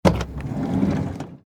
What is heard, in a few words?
Vehicle Van Side Door Slide Open Automobile Minivan; Cars (Sound Effects:Vehicles)